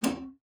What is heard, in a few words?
PLOK A#3, spinet; Xsample VOL-7